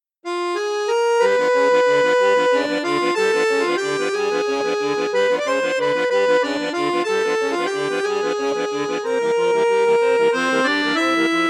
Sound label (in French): registre basson flute juste, accordéon; maugein mini sonora